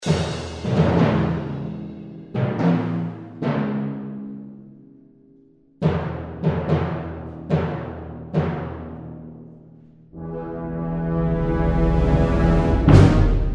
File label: Symphonic band; Massada; Petach-Tikva Conservatoire Symphonic Band (Israel)